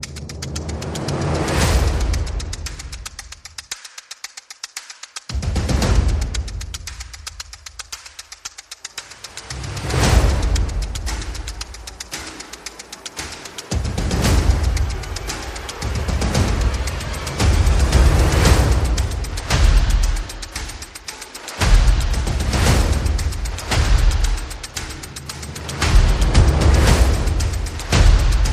Percussion and Effects

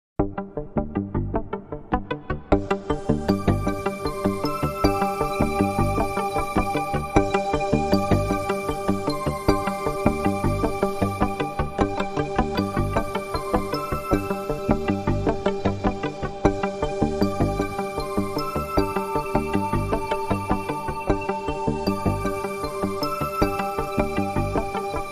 Medium Pulse Industrial Theme

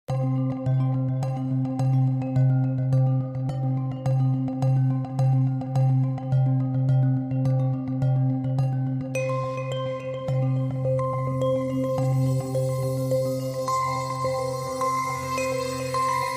Morning Bells

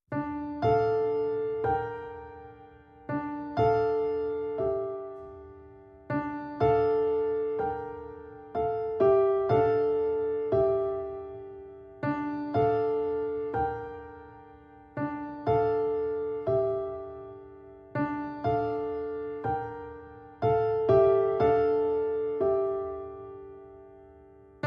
Solo Piano

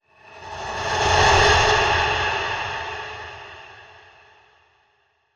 Weird Airy Whoosh

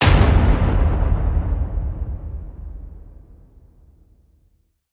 Hit Big Cinder Debris

